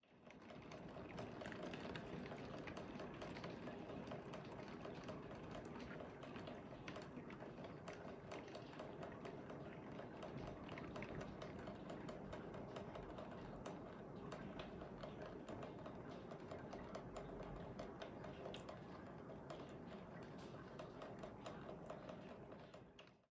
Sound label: Rain Closed Window